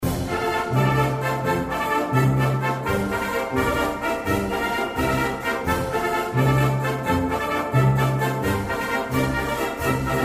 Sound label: waltz; waldteufel; espana waltz; klasyczna